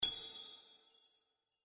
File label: win chime; Eternal Wishes LuckyTap